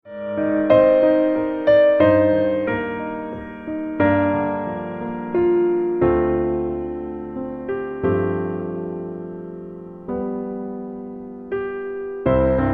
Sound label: Pno Low